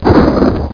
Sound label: dead ship; warpath